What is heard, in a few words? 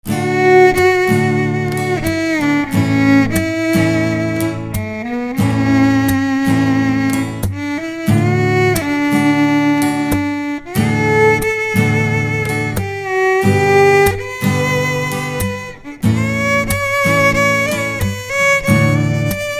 Violin